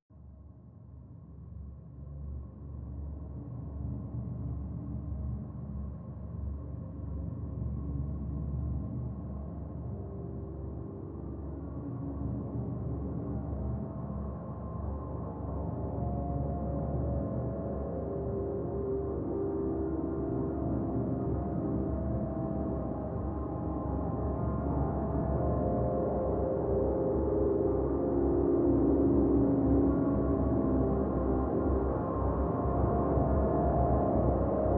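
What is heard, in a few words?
drone C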